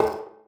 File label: pipe step; Golden Crayon Sounds